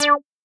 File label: synth